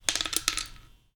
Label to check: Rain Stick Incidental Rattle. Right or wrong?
Right